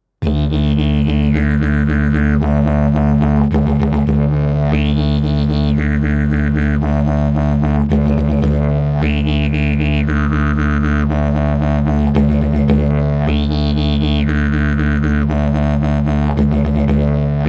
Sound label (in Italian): ritmo livello intermedio